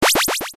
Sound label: powerup; ninja gamma